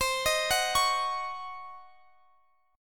Db Major 7th Suspended 2nd Suspended 4th, C, guitar